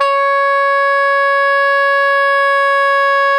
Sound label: SOPRANO C#4, Saxophones; FF; Greytsounds; Philip Wolfe David Crozier's Saxophones